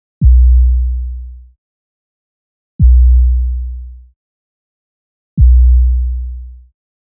HipHop Kick 808; Drums; Pro Tools Essential Loops; Digidesign

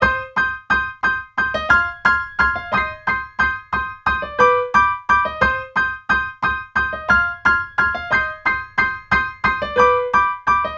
South HipHop Piano 8Th Cm; Digidesign; Pro Tools; Pro Tools Essential Demos; Essential Loop Demos; Demo Session - Hip Hop

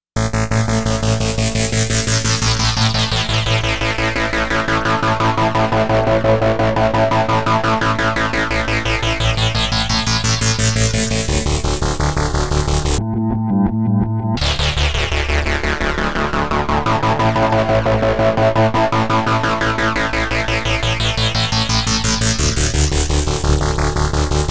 Virus Arpeg; Pro Tools Essential Demos; Digidesign

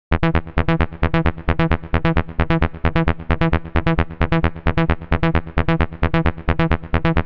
132 House Arp E; Dance DJ; Pro Tools Essential Demos; Digidesign; Essential Loop Demos; Demo Session - Dance DJ

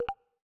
generic click; Ubisoft; Ubisoft Game Launcher

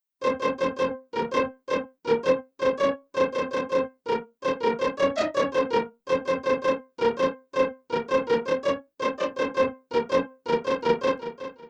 R&B Pizz Cm; R&B Funk Soul; Digidesign; Pro Tools; Pro Tools Essential Demos; Essential Loop Demos; Demo Session

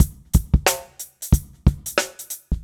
HipHop Live Drums Gate; Digidesign; Pro Tools; Pro Tools Essential Loops

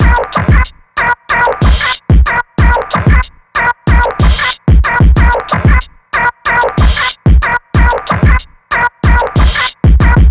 HipHop Drums Synth Combo E min; Digidesign; Pro Tools Essential Loops

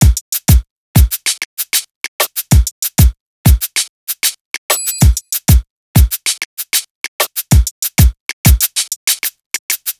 E Coast HipHop Drums

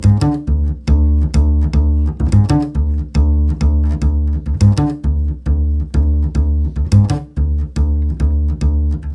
NuJazz Bass D; Digidesign; Pro Tools Essential Demos; Essential Loop Demos; Demo Session - Jazz

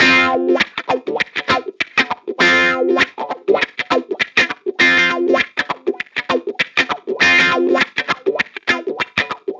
100 NuJazz Guitar Dmaj, Jazz Guitar Loops; Pro Tools Essential Loops; Digidesign

